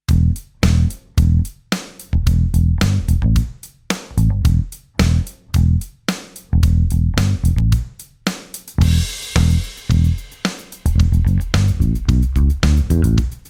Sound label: bass